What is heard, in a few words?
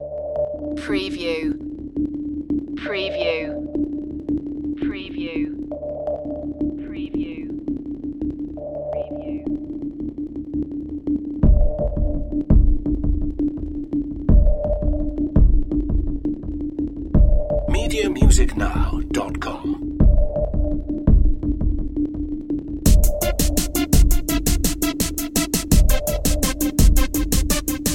dark synth